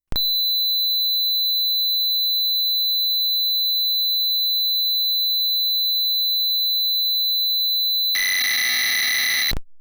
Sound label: modem for next beep; calculators; el-5500; computers